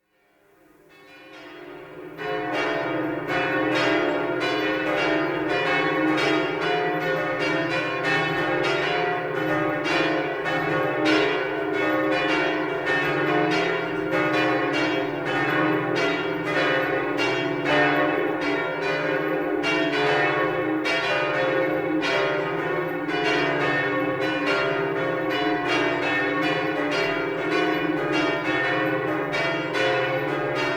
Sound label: Bells of the Northern Tower, Notre-Dame in Paris; Great Hours of Liturgy at Notre-Dame in Paris